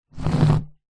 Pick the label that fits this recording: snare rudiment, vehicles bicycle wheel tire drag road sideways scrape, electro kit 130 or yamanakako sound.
vehicles bicycle wheel tire drag road sideways scrape